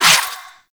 Shadow Melee Swipe; Warframe; Duviri

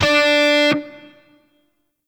Shawzin Oct Three D; Warframe; Grineer Shawzin

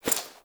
Body Move; Frumentarius; Warframe; Ambience; Nineteen Ninety Nine

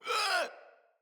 Infested Runner Pain

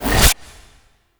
Operators Amp Chassis Part C Fire End; Warframe; Amplifiers

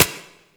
Turret Low Clip; Lasrian APC Turret; Weapons

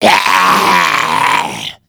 Mariner Zombie Attack, Vocals; Grineer Mariner; Warframe

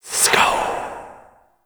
Grimoire Fire Whispers; Warframe; Tenno

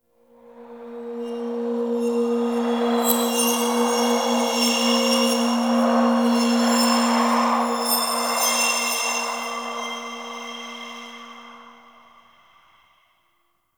Interior Cave Spirit Gust; Ambience; Duviri

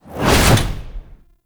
Alchemy Grenade Throw; Entrati

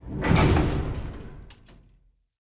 Pollution Tower Elevator Stop A

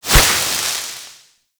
Hazard Sparks Start; Gameplay; Stadium; Warframe; Nineteen Ninety Nine

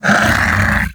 Undazoa Vocal Pain; Warframe; Hunting Critters; Characters

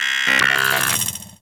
Amalgam Moa Vocal Idle

